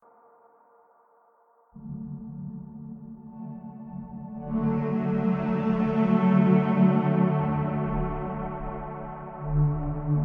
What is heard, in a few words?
Thunder Dreams; At the Mountains of Madness